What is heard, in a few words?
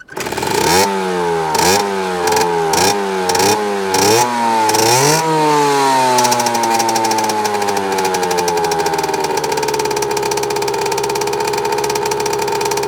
motorbike start stop; best service Blue Box